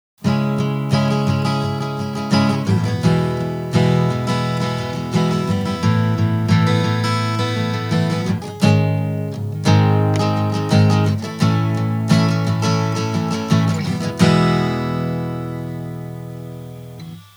Acoustic Left

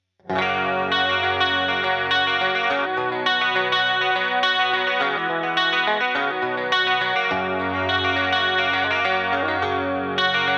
INTRO CLEAN GUITAR

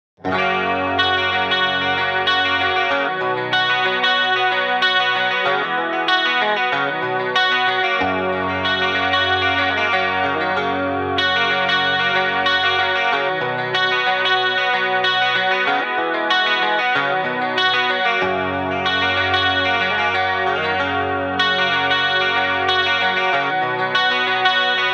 Intro Guitar

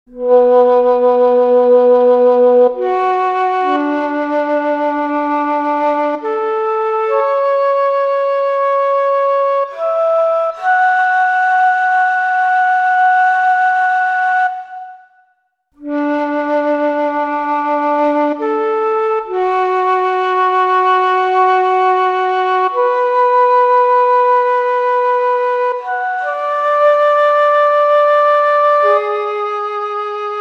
Flute Intro

